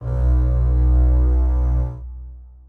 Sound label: strings